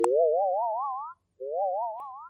daydreaming emote; animal crossing

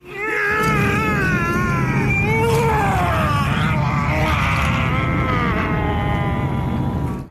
groan; gemini

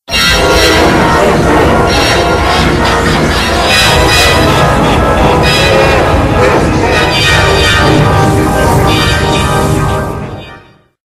game over in g major; sonic cd

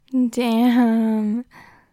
giggles

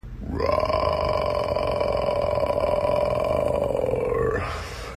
rawr

